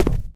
plaster, player footsteps survivor walk; source engine; left 4 dead 2

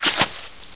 flesh, debris; source engine; hl1; video games

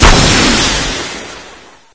grenade fire, waste scanner; Source Engine; video game; npc

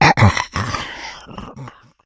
death, infected

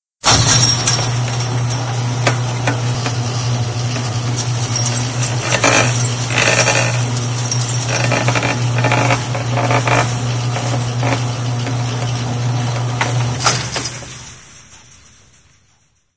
chainlink fence open; Left 4 Dead 2; Source Engine

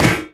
physics turret impact md

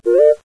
musica restore up; windows 9x; sound schemes

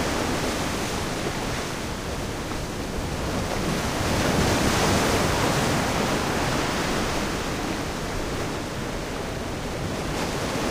perimeter ocean close; Source Engine; tf; lair

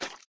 flesh, footsteps, run; player; source engine; left 4 dead 2; boomer

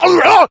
rage at victim, infected; npc; Left 4 Dead 2; Source Engine

